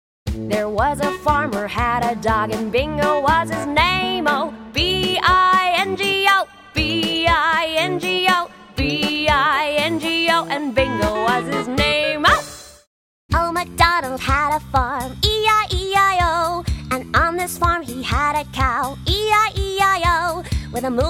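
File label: American, songs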